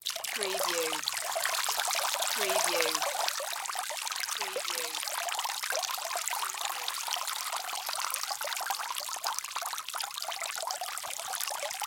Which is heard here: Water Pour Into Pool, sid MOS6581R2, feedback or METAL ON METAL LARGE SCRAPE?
Water Pour Into Pool